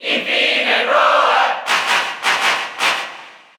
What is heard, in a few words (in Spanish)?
Grito del público, Español; Incineroar; SSBU